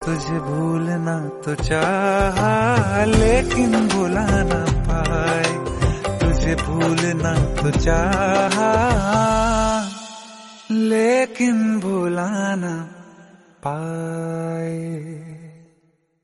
sad bollywood song tone ringtone

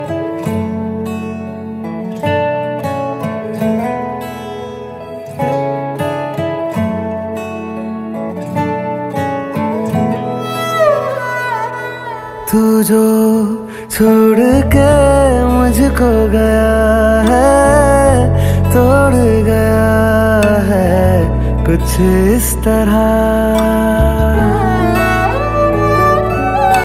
ringtone bollywood ringtones

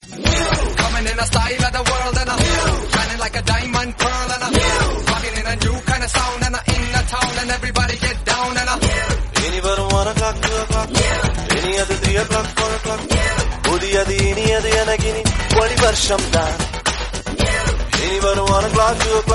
phone tone ringtone

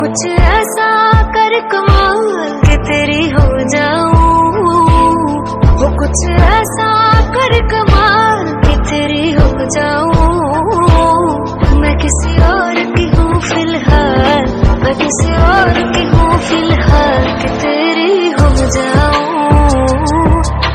romantic music ringtone, bollywood; bollywood ringtones

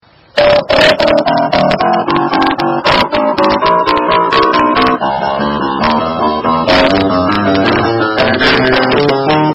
message ringtone; i phone

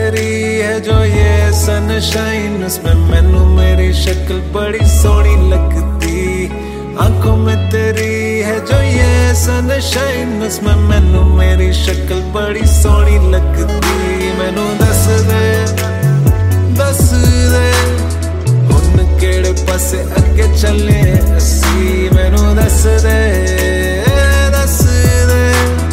hindi ringtone, bollywood

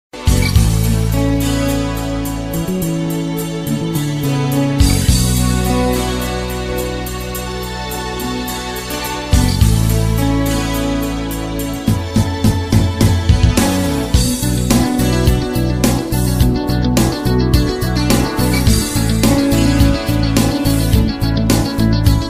nice music ringtone

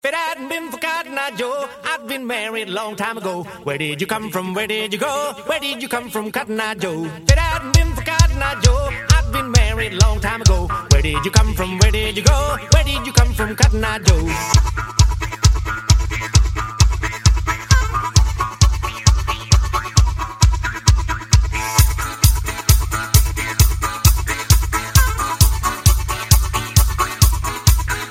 ringtone, nokia ringtones

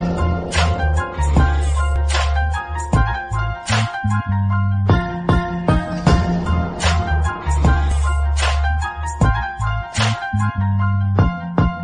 song instrumental music tone ringtone

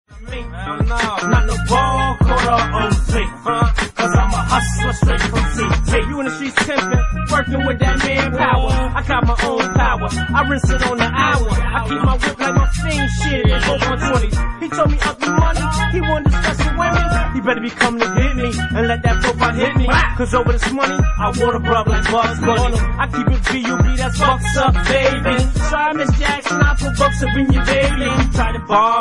english song tone ringtone

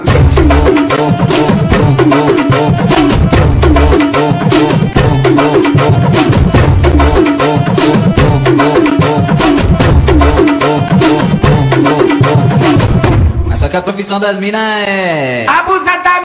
dj remix ringtone

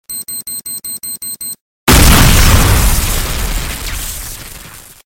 time bomb ringtone; grounded

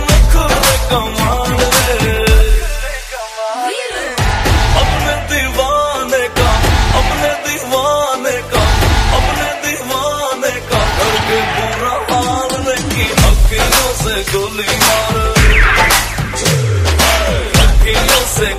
instrumental ringtone; bollywood ringtones

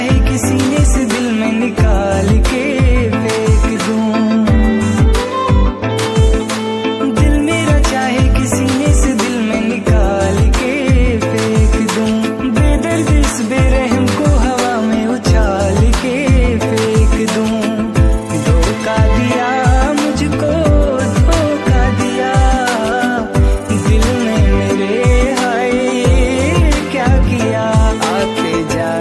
song punjabi ringtone; bollywood ringtones

